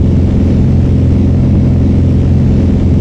leo2a5 engine int rpm 99 load, tanks; War Thunder

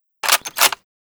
kar98 rechamber; weapons; Call of Duty Modern Warfare

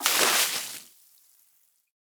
waterbucket splash; Rust

